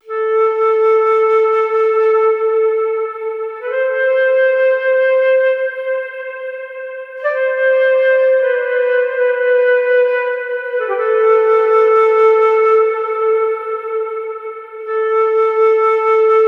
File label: Flute Intro Am 65Bpm; Construction Kits; Ghosthack; SkyRealm; Solace in Falkreath